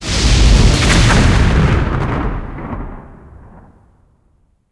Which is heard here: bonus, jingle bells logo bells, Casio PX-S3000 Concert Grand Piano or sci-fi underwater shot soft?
bonus